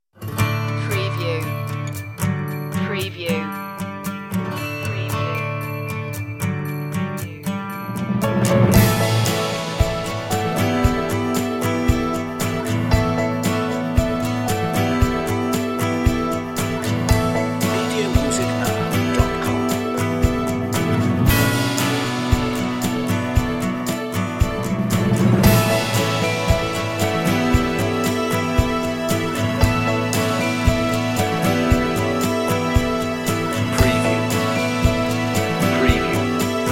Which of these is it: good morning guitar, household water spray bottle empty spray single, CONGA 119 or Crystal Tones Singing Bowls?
good morning guitar